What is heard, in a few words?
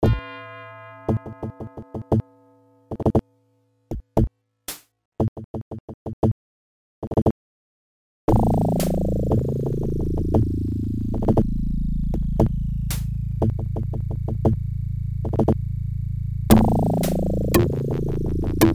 Weirdoh Chamber